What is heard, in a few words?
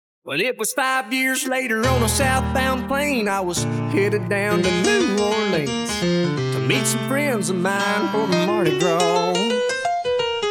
Piano + Vocals; 2nd Verse